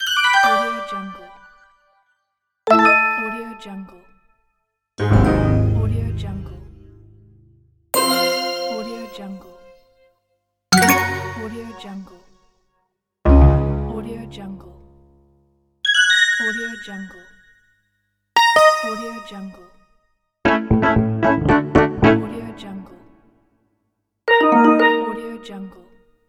app menu sfx

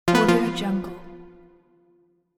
game reward

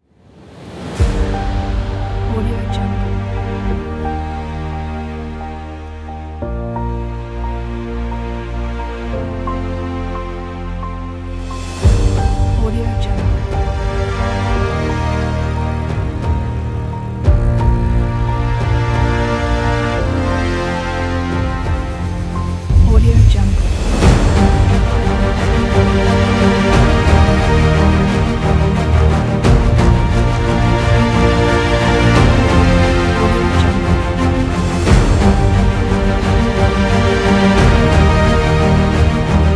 epic dramatic

